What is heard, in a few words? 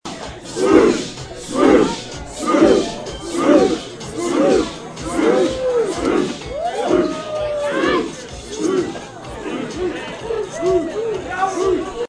SWOOSH; greasepole; Out Takes